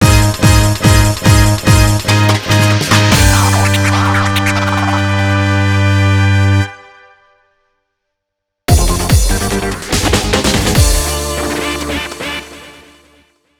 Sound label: Success and Fail; Spy Hunter